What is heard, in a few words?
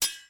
Hit; SandBox Musics